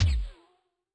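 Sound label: bass drum industrial, Kick, Yamaha DTXpress-II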